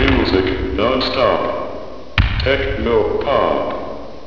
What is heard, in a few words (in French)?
MUSIQUE